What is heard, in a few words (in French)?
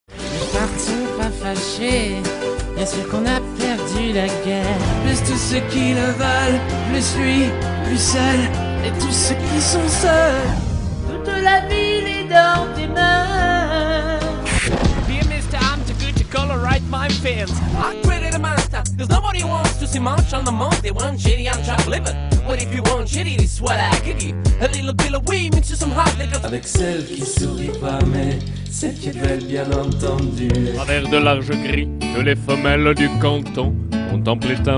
imitations chant; accents imitations